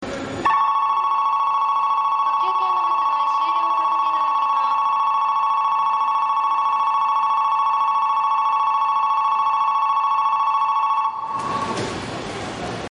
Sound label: bell; o odawara9; 発車ベル; ７番ホーム